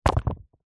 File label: sport soccer boots drop on hard ground; zapsplat